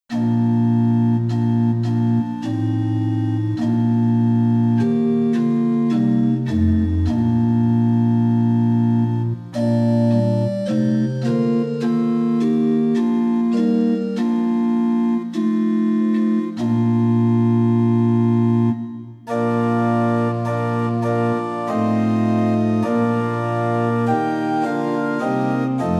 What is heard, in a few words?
Pitch 440 Temperament Equal